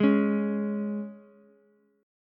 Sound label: C sharp 5th C sharp, Ukulele; strummed; C#5; JGuitar